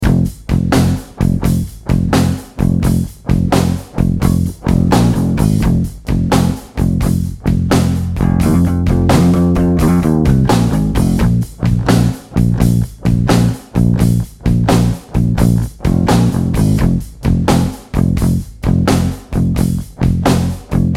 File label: blues in f